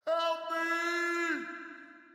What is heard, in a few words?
pirate call; Pirates; mermaids song